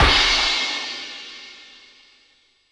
Crash, Dance, Beats

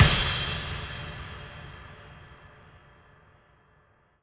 Crash Accent; Dance; SFX

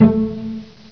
CELLO A; MUSIC; INSTRMNT

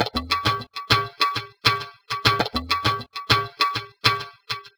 Hituned Tabla Beat

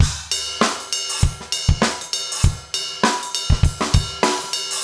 basic funk 100

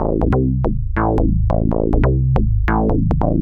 bassline, dance

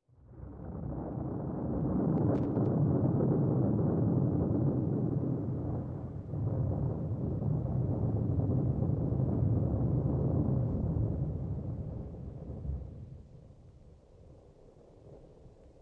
Drums Of Thunder

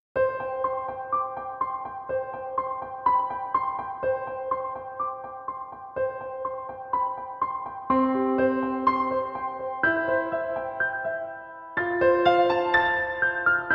Lullaby, Piano

